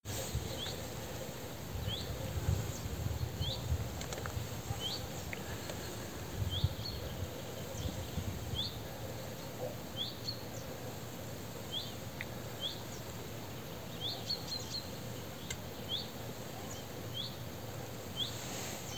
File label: Common Chaffinch Fringilla coelebs